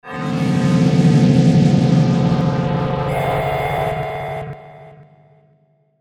Dark Synth, cinematic drama soundbeds; musicradar

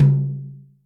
Lite Mid, Acoustic Tom

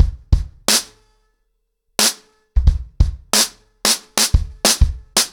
90BPM, ACOUSTIC DRUMS

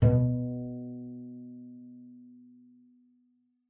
B2, bass pizz; Xsample VOL-5

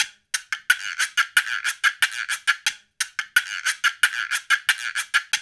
African Contemporary, Mozambique; Spectrasonics; Supreme Beats

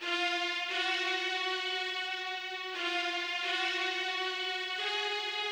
Hip Hop and R&B Drumloops

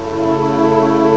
ORCH, STRINGS; STRINGS3

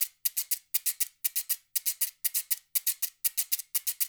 LOOP, SHAKERS; InVision Interactive; Percussion Slam

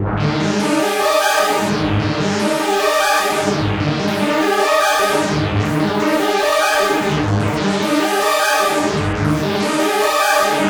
FLUTISCHPHAZ, ARPEGGIO 150; Masterbits - Tekkno Trax

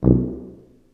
TUBA C, stacc; Roland L-CDX-03 Disk 2

